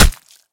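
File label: cold weapon hit the target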